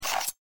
knife deploy

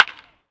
Baseball Bat

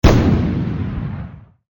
gun 40mm

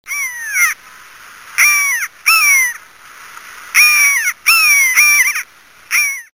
buzzard

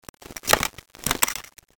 kit rustle

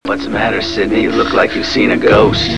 ghost

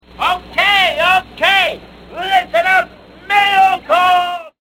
Mail

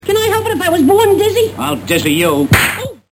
born dizzy, Boing

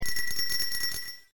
BELLS

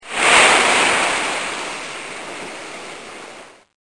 ocean

